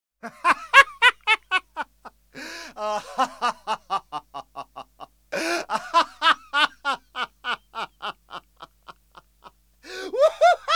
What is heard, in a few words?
Man Laughing; KMP Film Video Sound Effects